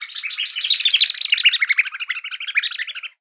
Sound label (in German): VOGEL, PERCUSSION; Best Service ProSamples vol.42